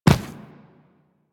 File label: Infested Medium Land Default, footsteps; Warframe